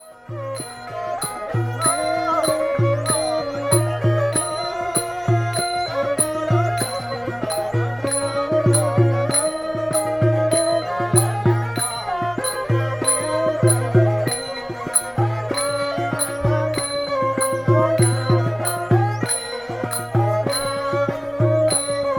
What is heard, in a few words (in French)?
Musique Khmer